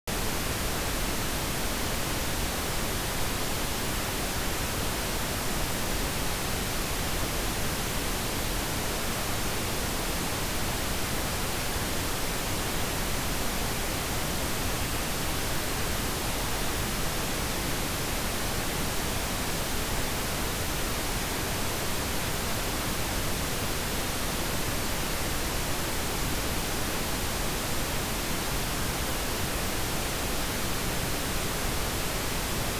Reference Tones Right-channel pink noise; Surround Spectacular

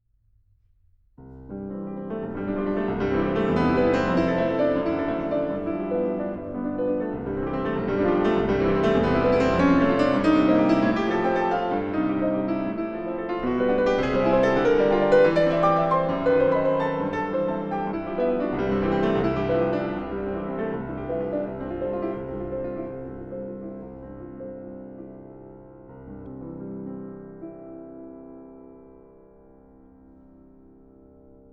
C major: Agitato, piano

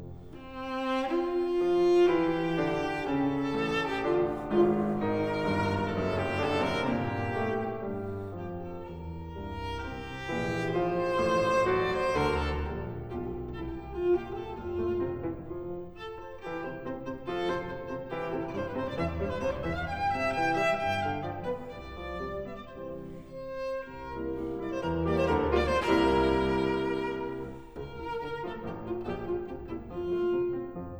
Variations for cello & piano in F major

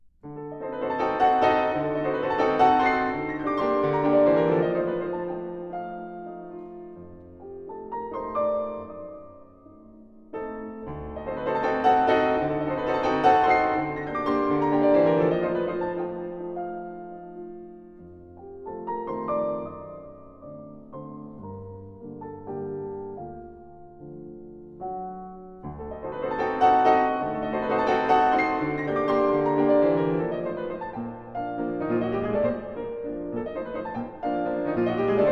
Carnaval for piano; Florestan; Schumann

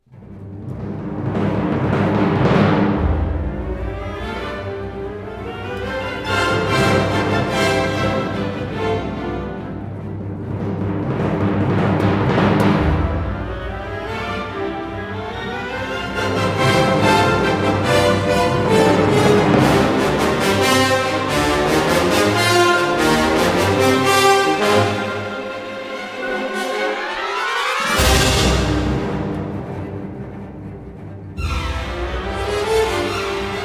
Enigma Variations, for orchestra, Presto; Elgar; 7． (Troyte．)

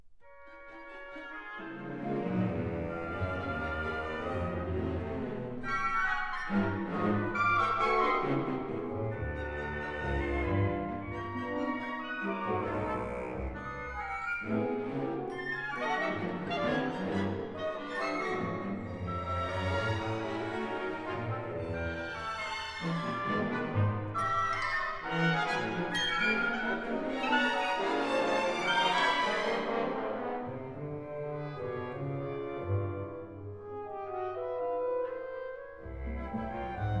Variations for Orchestra, Variation; Schoenberg

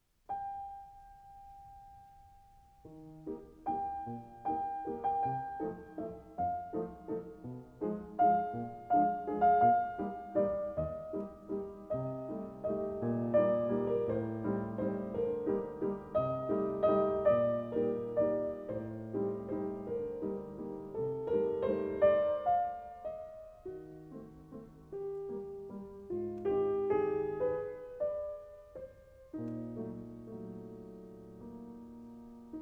Lyric Pieces for piano; Book 10, Op. 71; Grieg

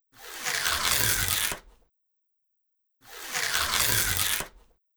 Tape roller unrolling

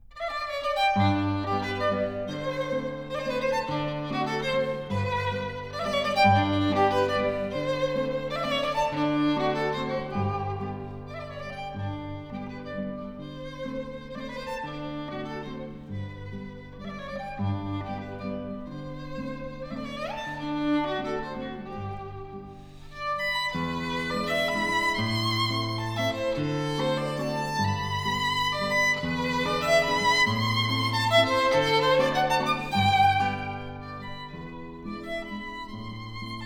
for piano Walzer

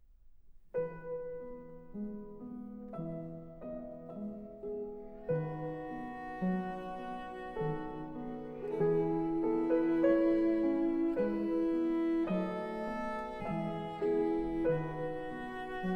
Sonata for Pianoforte and Cello in A major; Beethoven; III． Adagio cantabille

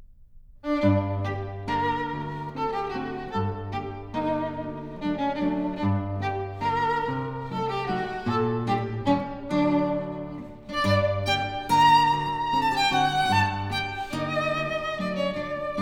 Waltzes for piano Valse

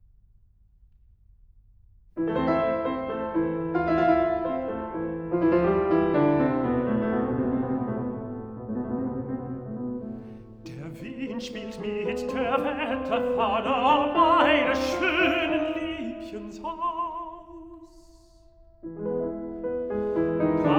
song cycle for voice & piano; Die Wetterfahne; Schubert; Winterreise

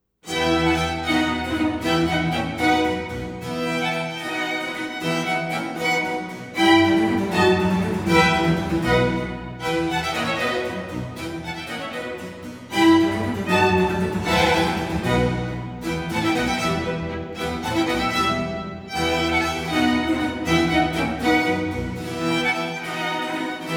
Concerto for Strings in E minor, Allegro molto; Antonio Vivaldi

